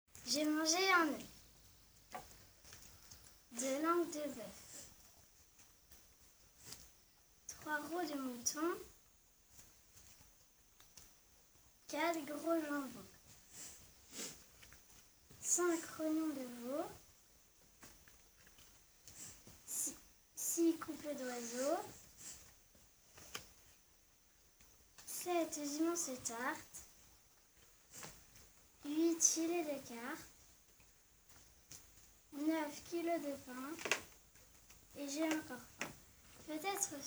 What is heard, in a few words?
vocal